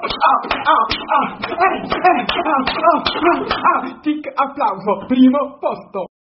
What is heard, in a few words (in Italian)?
applauso